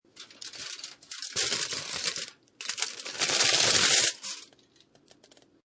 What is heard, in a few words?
Metal Foil Aluminium Foil Rip; Ripping; Objects